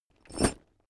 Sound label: backpack metal single